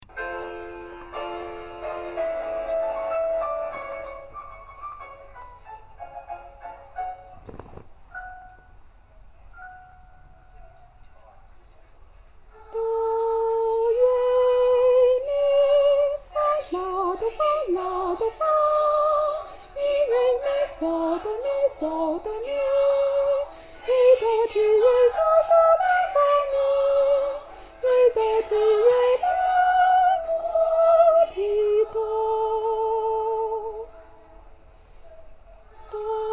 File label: bd soprano